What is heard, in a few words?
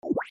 ooweep